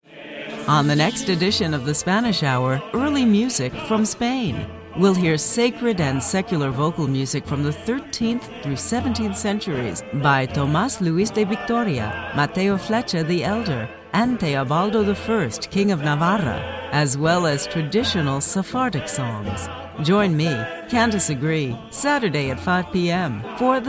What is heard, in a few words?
sacred and secular vocal music of spain; 17th century